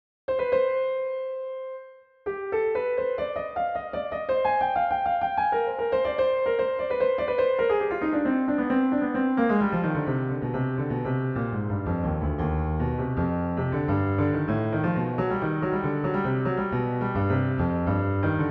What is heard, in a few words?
Bach BWV 911 Tocata in c minor NY Steinway D; NY Steinway D Classical Recording; Recording Bach BWV 911 Toccata; Pianoteq